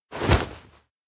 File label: grab arm; koyomi; anemoi パッケージ版 1.1